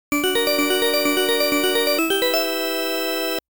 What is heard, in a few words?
Game Over MUSIC; Libble Rabble